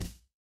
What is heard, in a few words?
impact thud